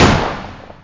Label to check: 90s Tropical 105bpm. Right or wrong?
Wrong